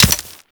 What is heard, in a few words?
Ghoul Expired Grenade Hit Dirt; Warframe; Grineer Ghouls